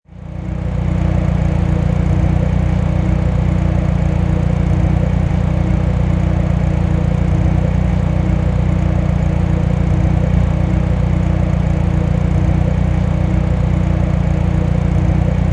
Nissan Silvia Exhaust Idle, Auto, Power Car, High Performance Car Sound; Exhaust perspective. Engine idling, idle, ticking over